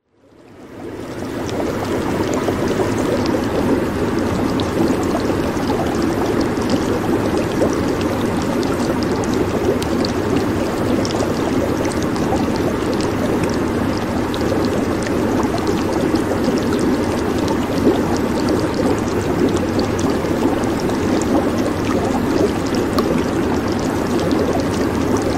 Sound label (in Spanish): Sonido de Jacuzzi Relajante